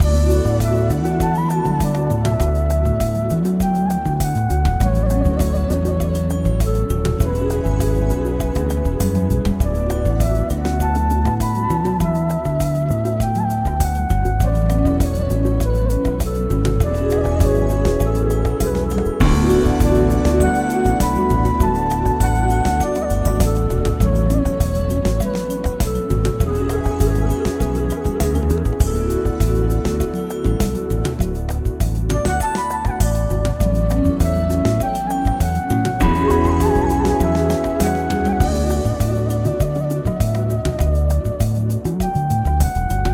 main music; RS Games Sounds; Werewolf

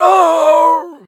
death; Original TK Sounds

